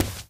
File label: run tile, footsteps